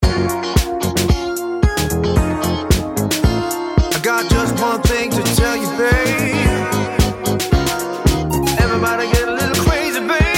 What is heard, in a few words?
Disco Grooves happy hour